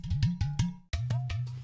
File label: village, jungle